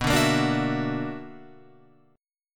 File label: B-Minor Major 11th, Guitar Open F; BmM11; strummed; JGuitar